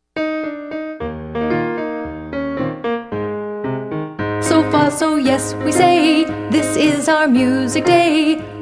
Song For Teaching Children That It's Time for Music; Music Class; So fa so... Yes! we say, This is our music day